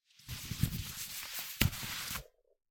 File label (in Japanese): ジーパンのボタンを止める音